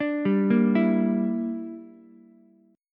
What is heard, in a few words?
A sharp 6th Flat 5th, Ukulele; A#6b5 arpeggiated; JGuitar